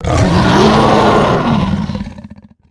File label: Blue Dragon Death